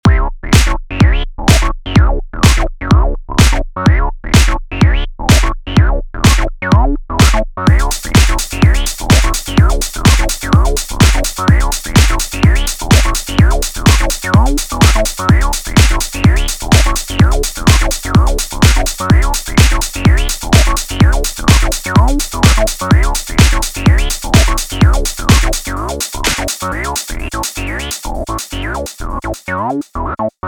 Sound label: bass faxx, acid; 100 acid vol 2